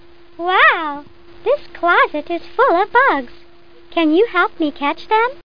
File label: bug; How Many Bugs in a Box